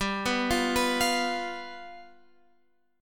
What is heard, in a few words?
G 7th G down Guitar Open F; G7 arpeggiated